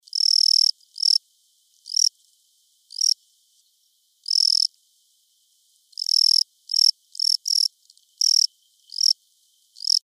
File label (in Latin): Melanogryllus desertus; BIOAC ORTH